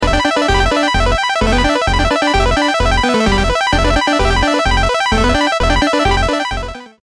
old video game ringtone

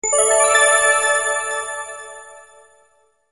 Sparkle Tone Alert

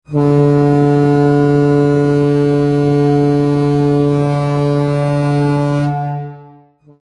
Large Horn Alarm

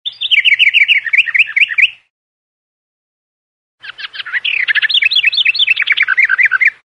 birds bird twitters and chirps

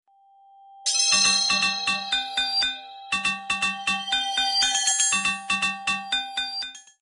weird bells

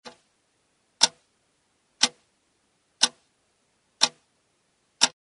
clocks tick tocks slow

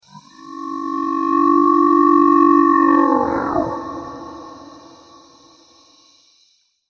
injured herrerasaurus

